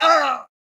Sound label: male hurt; ufoai; soldiers